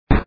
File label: Silence